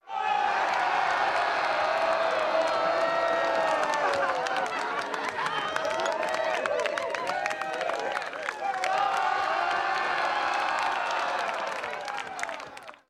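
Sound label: Crowd 100 Interior Cheer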